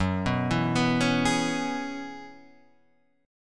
Gb 7th Suspended 2nd Suspended 4th, Gb7sus2sus4 chord; arpeggiated; Standard; JGuitar